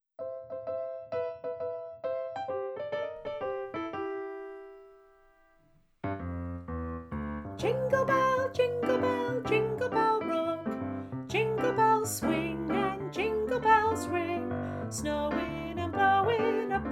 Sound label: full mix